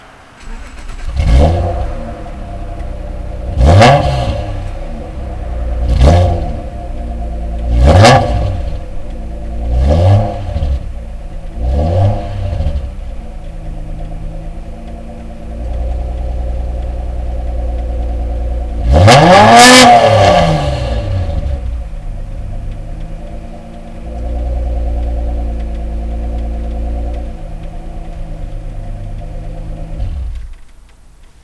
probe start rear, My cars exhaust note. not full RPM, Ford Probe; 1994